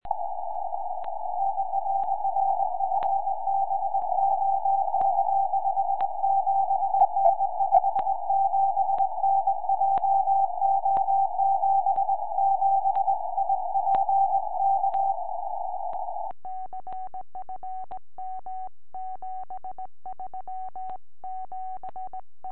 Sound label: 70cm, tropo